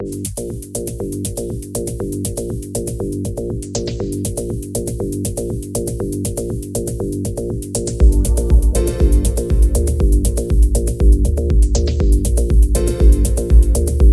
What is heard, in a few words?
Heavy Bass 120 BPM; Dub Tales